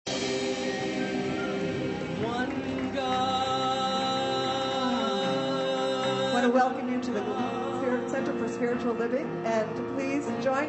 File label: singing